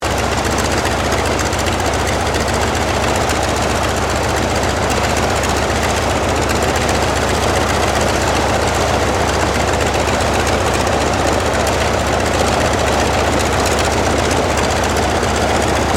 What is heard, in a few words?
AIRCRAFT CAC WIRRAWAY IDLE; CA-16 Wirraway; Airplanes; Vehicles